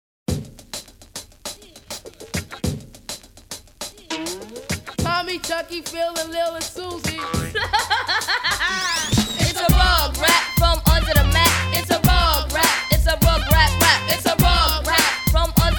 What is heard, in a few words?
rap; Rugrats